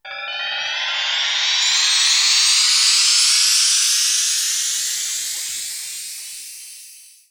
atmo; Techno Trance Essentials; FX & ATMO

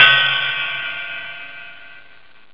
Cool Hat; jazz jackrabbit 2

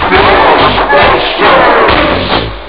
cry; ST-XX Amiga Soundtracker Sample Packs

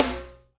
SNR

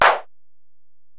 EPerc, Alesis DM-PRO; 300 Drum Machines

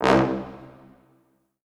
Orchestral Brass

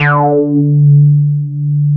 D4, Bass; E-MU Producer Series Vol. 6 – Analogia Project (CD 1); Boom da Bass 2

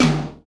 TOM TOM, Real

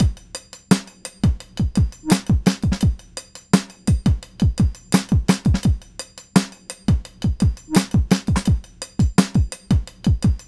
Beat, Hip-Hop

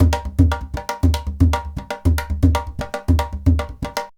IJEILU GROOV; Best Service; World Colours

